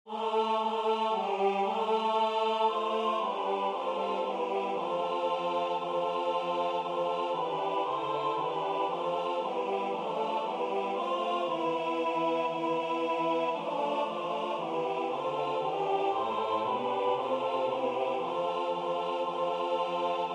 SATB; oomen

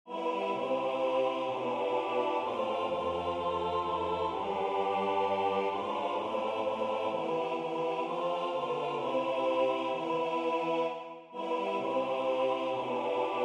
SATB; onze lieve vrouw van rust

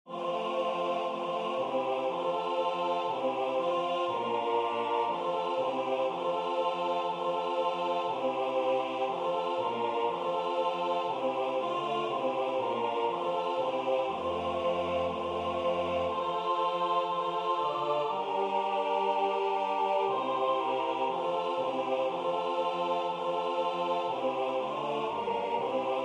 SATB; alta trinita beata

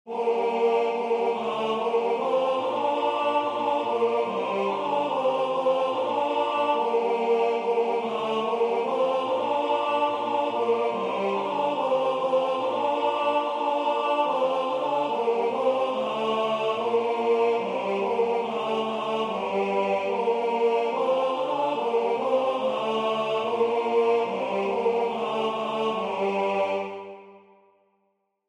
pavane T; arbeau; belle qui tiens ma vie

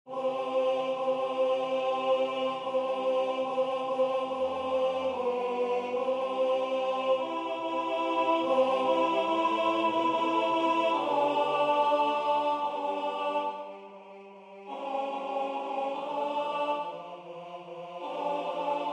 The Lord bless you alt

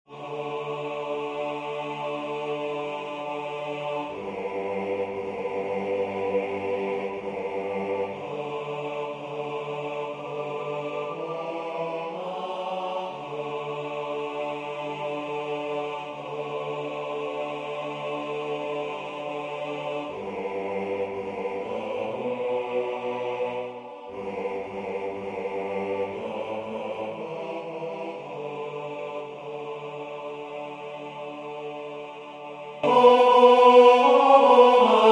Deep river B

